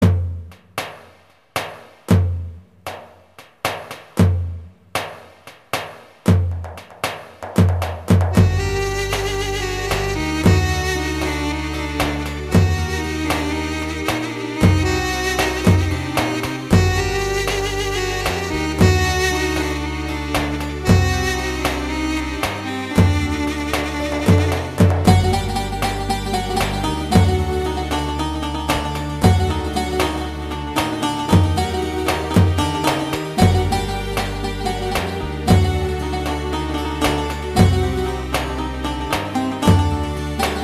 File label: ketron xd9 test; urfanin etrafi